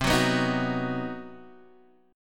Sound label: C Minor Major 7th Flat 5th C down Guitar Standard; strummed; JGuitar